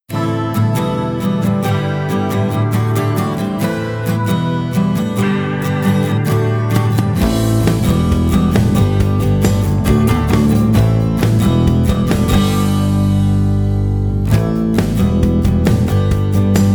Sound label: Acoustic Pop; Cues for Film and TV